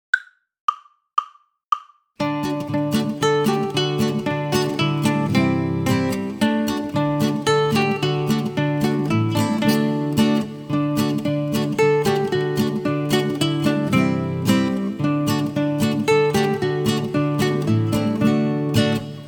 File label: square dance